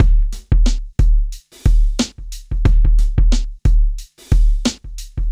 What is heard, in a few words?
Smooth, R&B Drums